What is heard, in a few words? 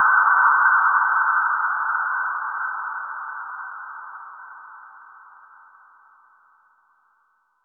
ui cancel sound falling; tail